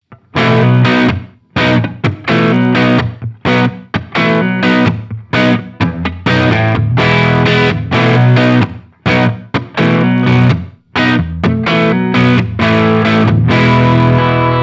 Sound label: Sound City Master One Hundred Humbucker Original